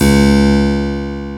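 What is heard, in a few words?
8 ft C#2, harpsichord; AKAI S-Series CD-ROM Sound Library VOL-2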